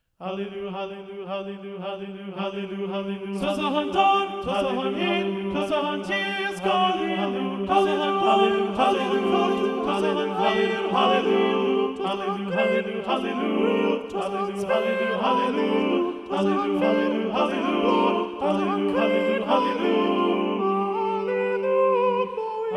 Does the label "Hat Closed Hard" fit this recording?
No